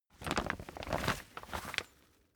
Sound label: camping chair pack up